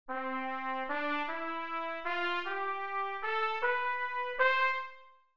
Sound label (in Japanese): ジャズリズム